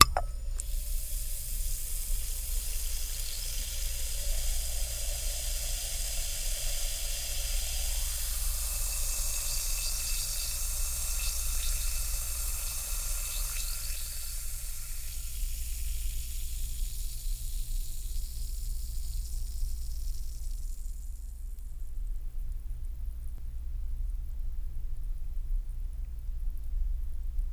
Clink On Wood Togethe, eating & drinking

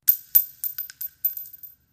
Shell Case Sngle Tube, shell casing movement

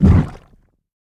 Blood Pump Heart; gore stabs & eat

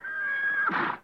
Horse Whinny Neigh Di

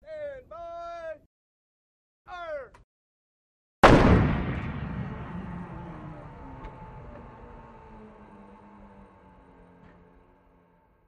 175mm Howitzer Gun

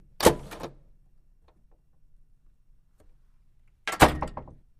Door Push Rail Wood

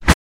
Axe Chop Reversed

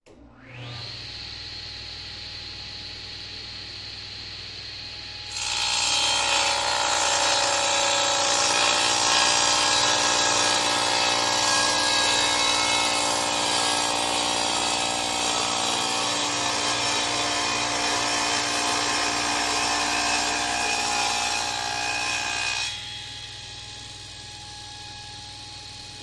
Tools, Table Saw

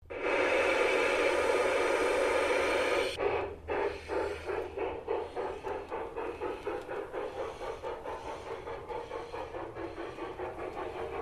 Antique Toy Steam Tra, toy train

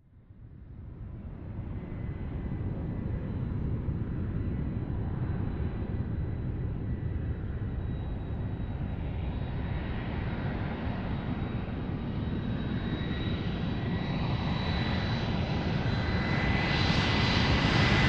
Commercial Jet By Close Up Overhead And L; Commercial Jet By Close Up Overhead And Land; Jet Landing

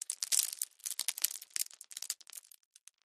Landscape Rock On Con, rocks & dirt

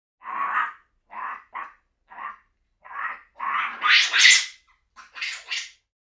Monkey Capuchin Chit

